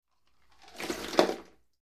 Ice Water Pour Chest, Ice And Water Poured From Ice Ch